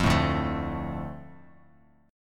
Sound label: D Minor D Bass Standard; Dm strummed; JGuitar